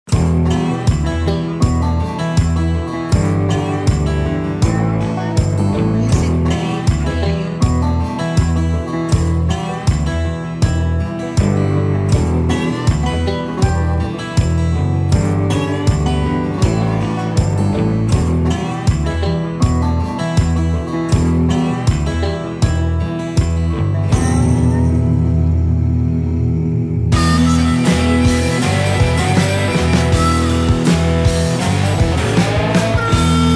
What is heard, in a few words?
Western Country Rock